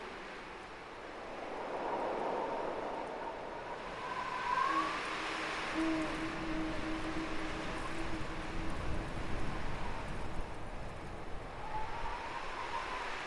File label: Winter Ambience; lol